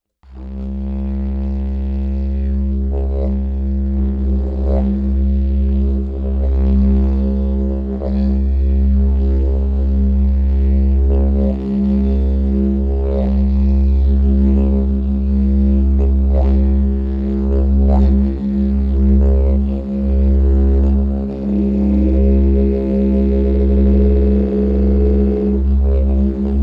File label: whale drifting